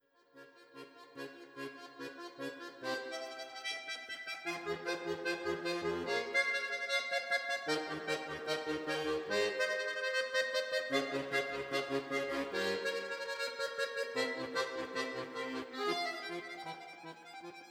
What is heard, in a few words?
accordion Vivaldi winter 1st mov the four seasons